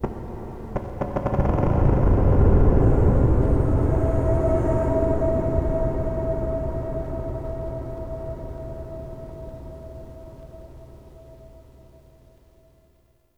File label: Dark Texture; Ambience; Warframe; Shadowgrapher